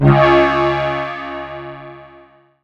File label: C#, BELL GONG, Synclav FM; Big Fish Audio